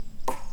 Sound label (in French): Baton creu Toc, PercuBois